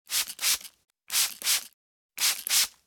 Spray bottle squirt fluid glass cleaner cleaning product